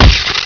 hit bod; weapons

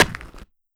ric gravel

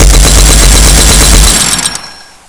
gun; hassault

